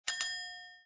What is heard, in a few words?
Chime; kickin it; bobby wasabi academy